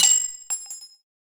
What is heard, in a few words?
Harpoon Shoot